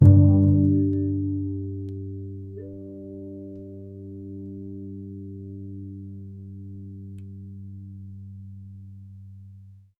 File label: pizzicato strings